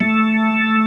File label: HAM A4 -L, KEYBORD